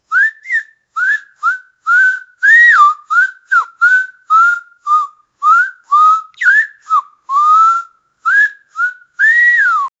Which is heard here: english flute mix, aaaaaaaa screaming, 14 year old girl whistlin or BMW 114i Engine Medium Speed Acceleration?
14 year old girl whistlin